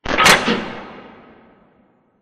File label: Robot Arm Track Stop Close; Boomtown; Titanfall 2